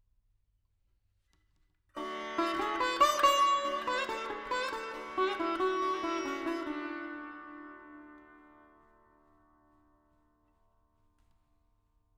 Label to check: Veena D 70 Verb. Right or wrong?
Right